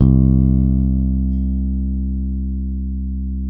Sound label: bass, sustain; Sampleheads; Will Lee Bass Library